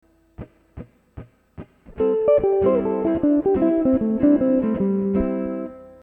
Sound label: modern jazz guitar licks